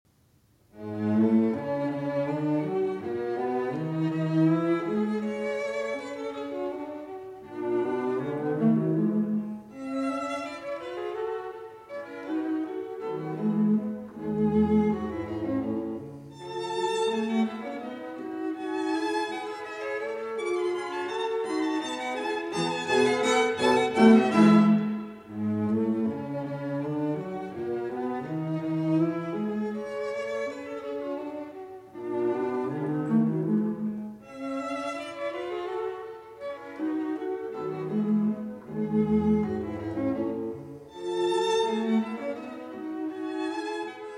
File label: String Quartet No. 15 in A Minor, Allegro Ma Non Tanto